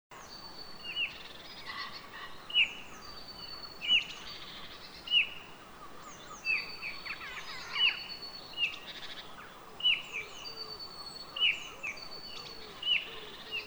Goudvink, vogelgeluiden

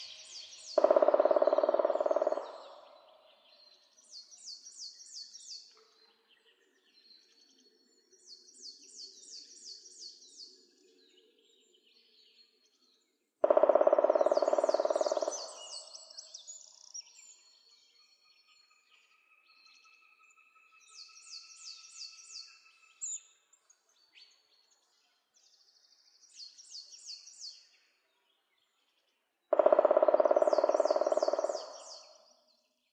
Zwarte Specht; Zang en andere geluiden van in Meijendel broedende soorten; CD "Wat Zingt daar" [2005]; VWG-Meijendel

